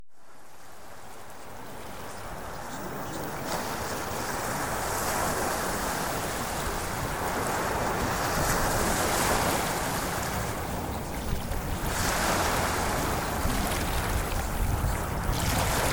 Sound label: Ocean Waves; Remove Subconscious Blockages